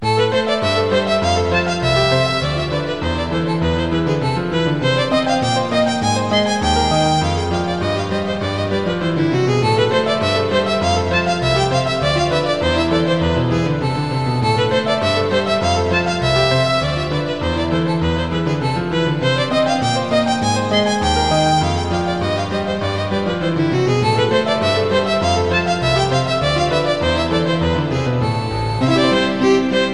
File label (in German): violine u klavier klavier; rumanische tanze nr. 3